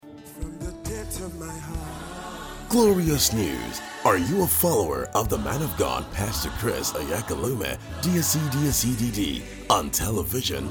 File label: JINGLE